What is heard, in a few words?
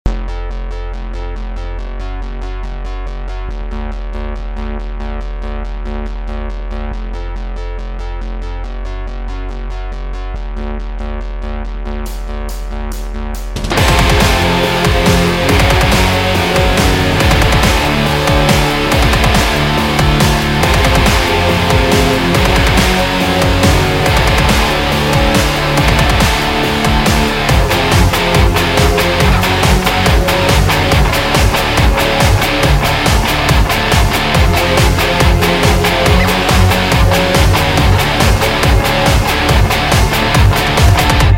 gtr